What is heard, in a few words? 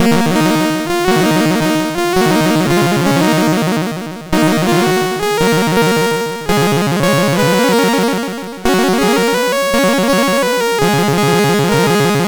sid MOS6581R4